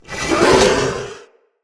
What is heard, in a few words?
Dog Attack Bite; Vocals; Corrupted Battle Dog